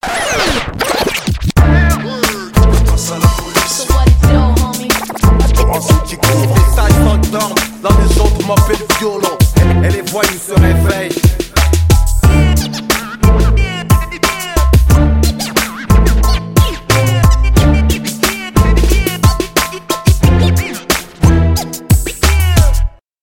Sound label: scratch